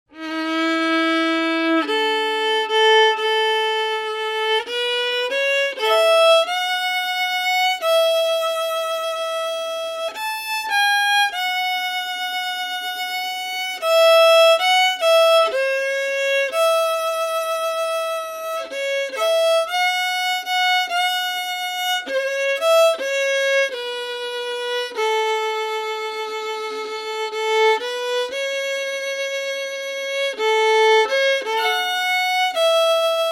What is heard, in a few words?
fiddle; Shenandoa